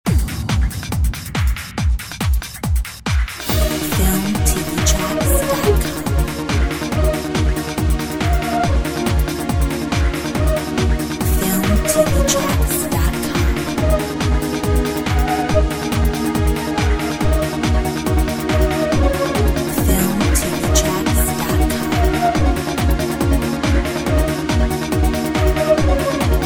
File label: techno